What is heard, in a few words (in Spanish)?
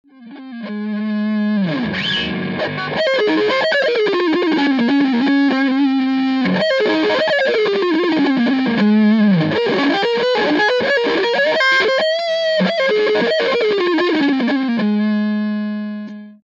sucio; TubeScreamer